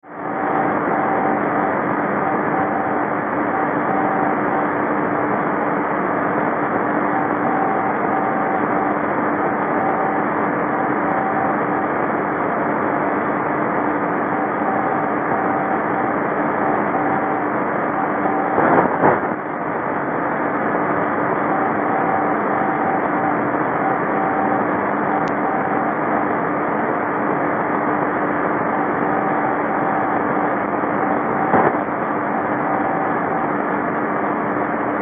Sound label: NDB Parma, Italia, 306 KHz